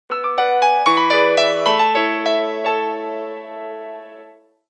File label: Nokia tune; 7710